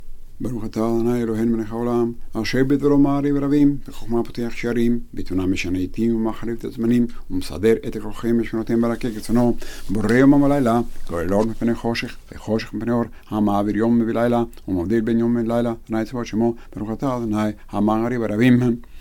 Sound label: Barukh maarib arabim, Sephardi; Weekday Arbit